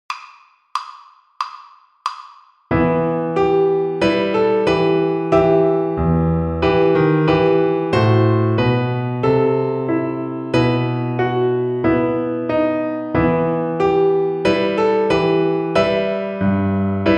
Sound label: String Gym, Piano Accompaniments; Etude 30 - Mini March in E minor